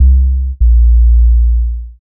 Bass, Futurist Drum & Bass

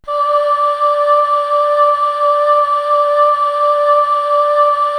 cho d4, Choir, KORG DSS-1

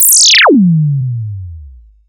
Zapps, sci-fi

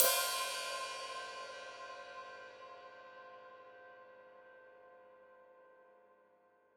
Hat Open, Hi-Hat; Deeply Sampled Drums

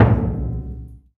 LOUD DRM, BURUNDI; Spectrasonics; Heart of Africa

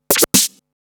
Scratch Fills 140BPM

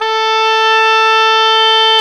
OBOE, mf f; Roland L-CDX-03 Disk 1; Oboe 2&3

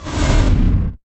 IMP FLAME, Cinematic Impact

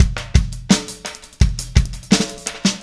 Static Beat; Sonic Foundry (Sony Creative Software); Indie Rock Anthems; Session 13 - Static

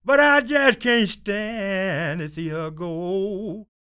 SING, Blues Soul; Spectrasonics Vocal Planet

